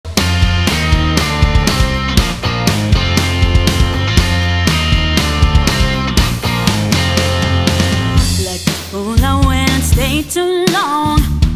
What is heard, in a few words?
band medley